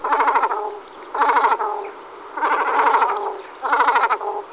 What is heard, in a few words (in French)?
grand cormoran